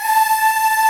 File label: Ending loop